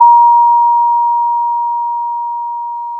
Sound of a school bell

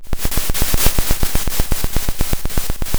Sound of a drum roll

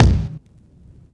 Vinyl Kick, drum

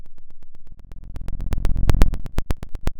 pop, Sound of a fire crackling

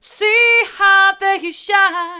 hard, vocal

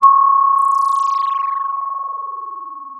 pop, Sound of a phone ringing

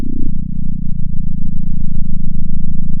pop, sound of a small motorcycle

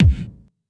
Elec Kick, drum

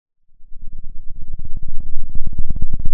Sound of a thunderstorm, center

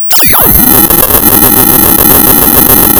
pop, tearing paper

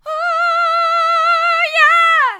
ooh yeah hard, vocal

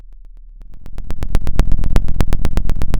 Sound of a fire crackling

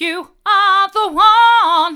hard, vocal

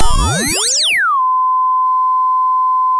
sound of a bike bell

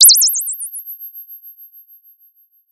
Sound of a bird tweeting nicely

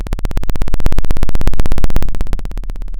Sound of a plane taking off

